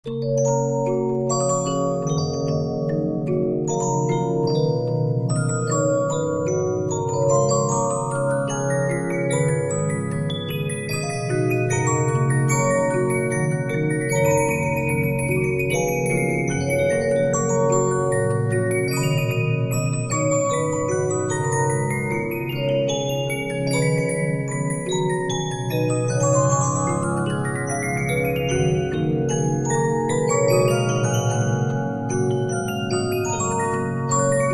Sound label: Regina, 15inch disc musicbox